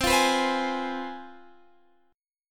C Major 7th Suspended 4th Sharp 5th, Guitar, DADGAD